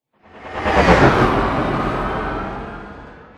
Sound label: Prop Warehouse Prop Rack Pass By; Titanfall 2; Boomtown